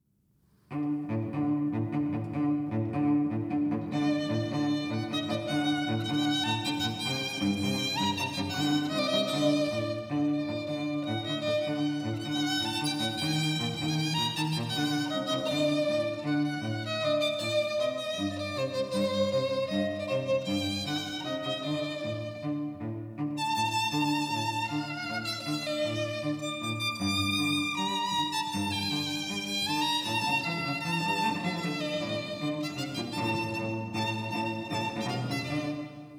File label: Jewish Medley traditional dance; Jewish Dance Song Medley - Violin & Cello